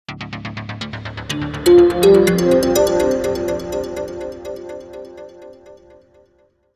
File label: tech news sting